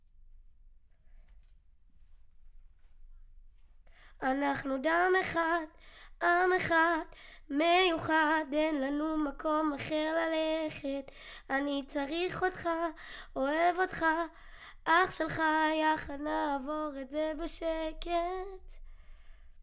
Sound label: Children Choir; Studio Bekol Children Choir